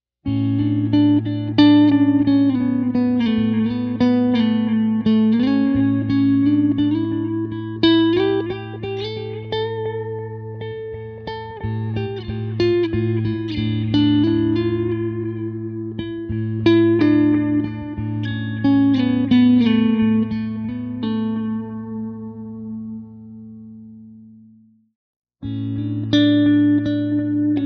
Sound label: ambient, pickups